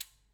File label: Back Pedal, Bicycle